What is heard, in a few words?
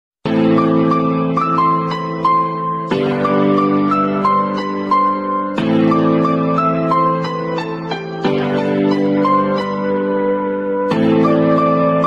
sad bgm sad songs Tamil